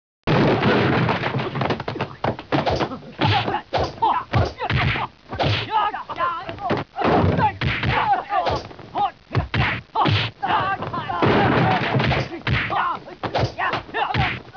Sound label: Fight; 特酷声音素材库